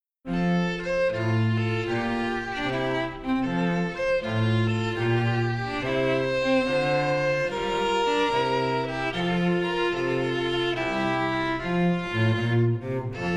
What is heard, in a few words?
trio